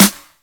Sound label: snares; Vengeance Samples